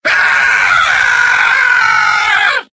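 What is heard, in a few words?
pain critical death; soldier; video games; source engine; tf; mvm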